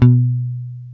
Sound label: B 3, hip-hop, bass; Spectrasonics; Bass Legends CD 1